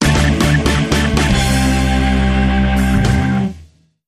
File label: Rock